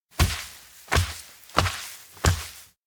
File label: knead soil baker; anemoi